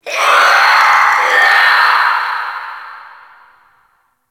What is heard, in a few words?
Infested Crawler Dist Vocal; Warframe